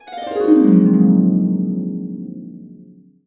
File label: AB, Harps; USB Soundscan vol.62 - Complete Harps; AKAI